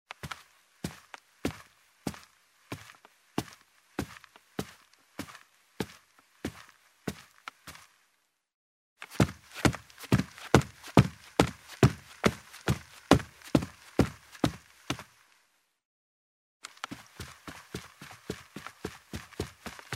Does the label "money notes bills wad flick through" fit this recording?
No